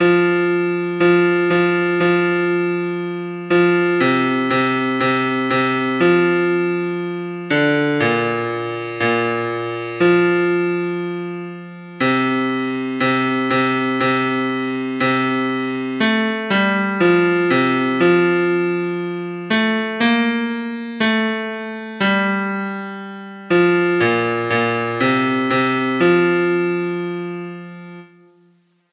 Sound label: Bass